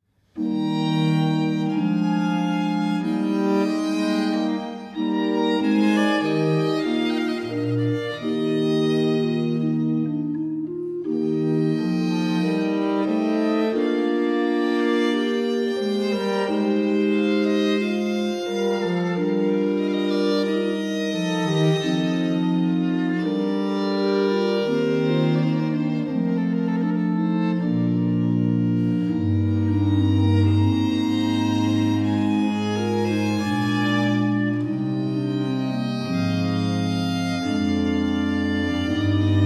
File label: Sonate 1 in F major - 1. Grave; Archangelo Corelli; Arcangelo Corelli; Corelli - Complete Works; Klassika